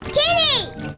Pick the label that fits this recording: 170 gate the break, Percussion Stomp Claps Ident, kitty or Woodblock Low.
kitty